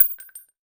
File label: CASING 7 62 mm Hard Surface